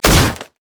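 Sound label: armadillo basic attack; rammus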